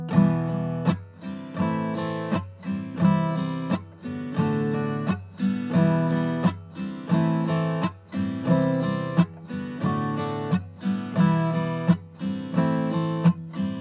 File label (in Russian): Boj CHetverka s glusheniem, gitare; Kak-nauchitsya-igrat-na-gitare